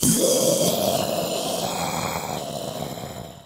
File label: Zombie; Blind Survival